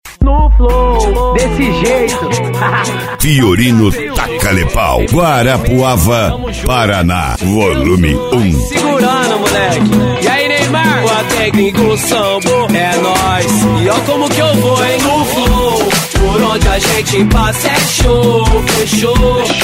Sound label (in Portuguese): especial funk e electro house